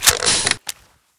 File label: reload, crossbow; weapons